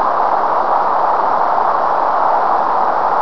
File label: aero hiss; PC Gamer IT CD 47; USAF; USAFDEMO